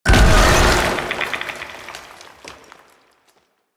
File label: Half Life Impact; Warframe; Warframes; Qorvex